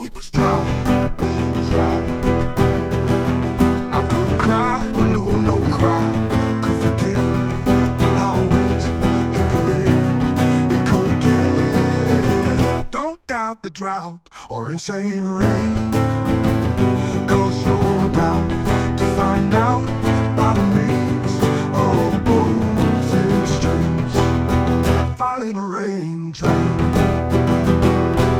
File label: Unplugged